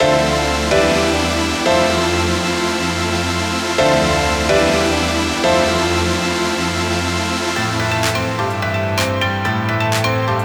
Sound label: Patched Grand; Zampler